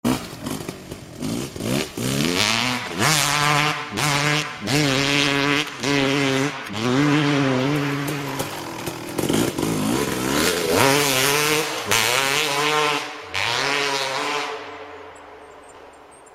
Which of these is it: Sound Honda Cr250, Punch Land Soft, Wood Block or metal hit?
Sound Honda Cr250